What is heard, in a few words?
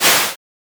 whoosh short